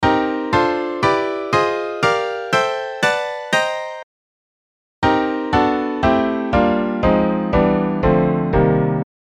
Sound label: cm7 piano